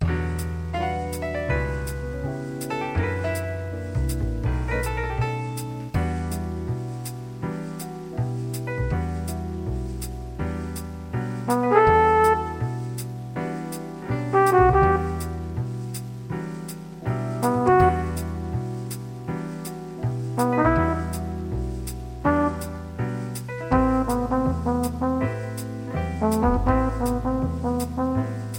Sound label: Horn